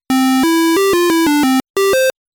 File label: square song, pc speaker